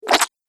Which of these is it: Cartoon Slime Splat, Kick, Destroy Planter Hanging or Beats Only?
Cartoon Slime Splat